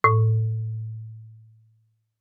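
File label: Marimba rubber fortissimo A2; MIS Pitches - 2014; Percussion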